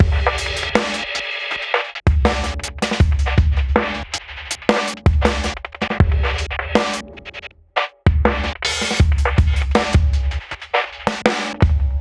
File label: Chopper Beat; Ударные и барабаны (Эмбиент); Drums; loop; Ambient; 80BPM; ambient vol. 3